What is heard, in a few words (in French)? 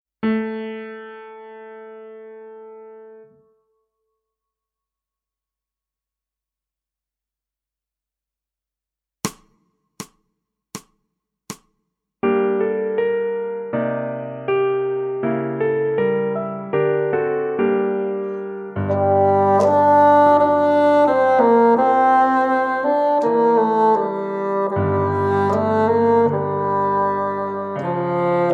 Basson